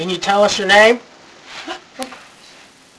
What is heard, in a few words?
uh ooh